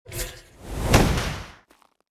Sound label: Skill Bullet Punch